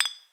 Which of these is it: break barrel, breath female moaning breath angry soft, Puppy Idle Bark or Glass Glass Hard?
Glass Glass Hard